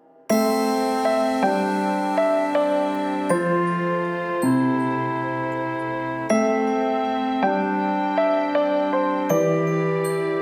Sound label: Victory Call; Figment